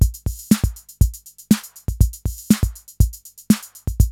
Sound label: CR-8000 LOOP, DRUM LOOPS; Syntec; Wall of Sounds; TECHNO-BOX